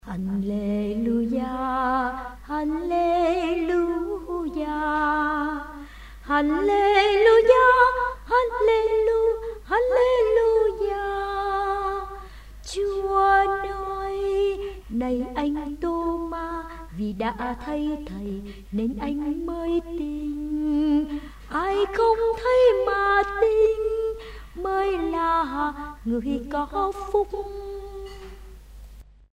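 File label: soprano tenor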